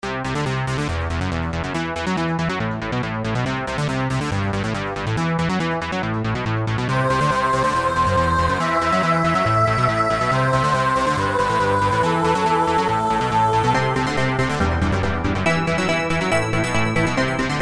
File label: ringing ringtone